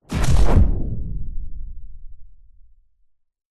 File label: science fiction weapon incoming bullet hit with whoosh; zapsplat